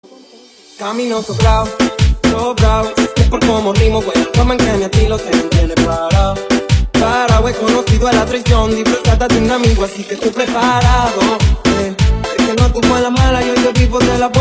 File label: Remix